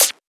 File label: Closed Hat